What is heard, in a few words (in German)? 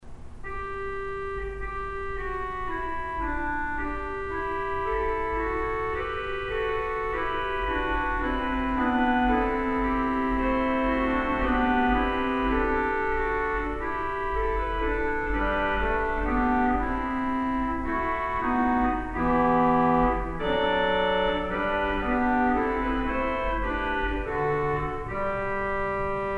Klarinette